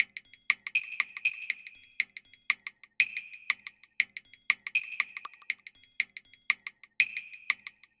bird pickup; Toki Tori 2+; Toki Tori Collection

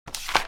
exit note

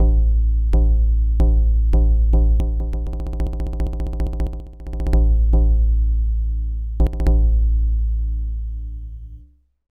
Drum Roll; Drum Roll 1 (Amakusa Demo)

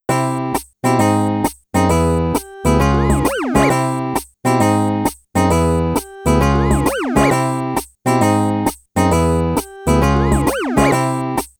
Menu; vib-ribbon

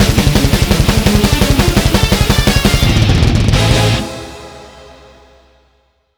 bgm gameover; bullet soul

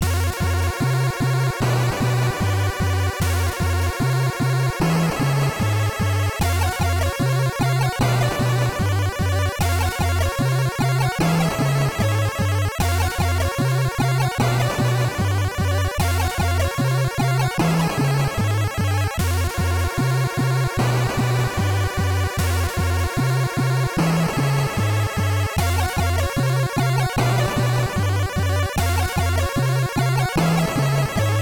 Volcano Level Music; M.C. Kids

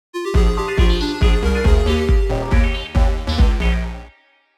Death; donkey kong country 2 fan remastered; Disco Train

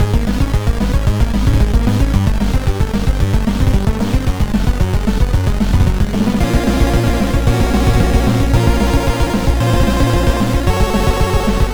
Boss B Loop

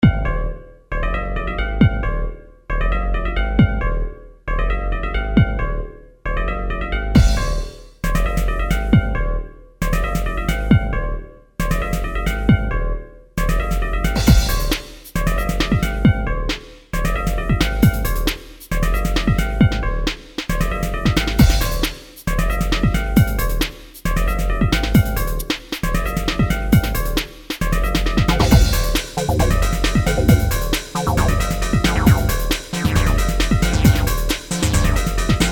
Smooth; Armored Core Masters of Arena

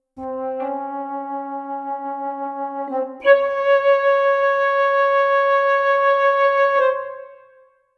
flute; Undead Overlord

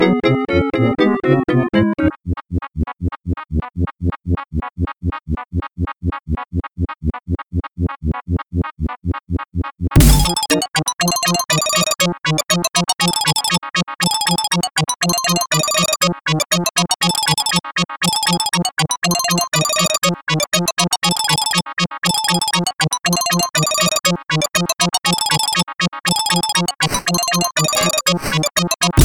MiniBoss - Desert; Level 1 MiniBoss - Desert; Taz-Mania Genesis

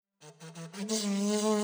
Mus Tsunami 145 Light; From Dust; PS3; Xbox 360

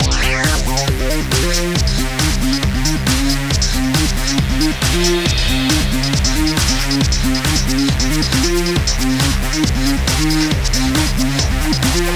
interrogation fast; Selenon Rising

